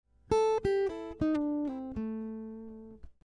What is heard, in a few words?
guitar slides phrase; slides on guitar